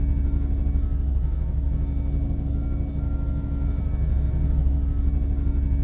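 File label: republic cruiser interior loop; Star Wars Obi Wan; XBOX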